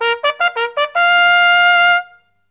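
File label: bugle charge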